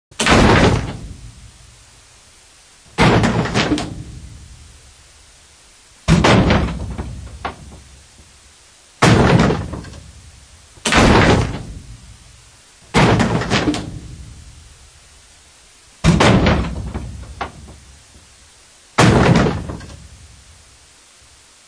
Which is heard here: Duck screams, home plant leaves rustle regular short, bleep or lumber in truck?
lumber in truck